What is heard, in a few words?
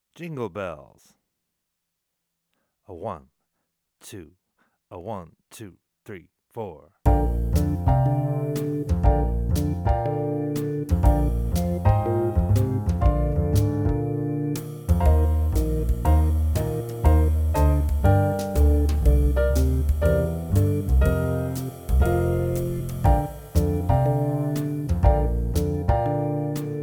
play alongs Jingle Bells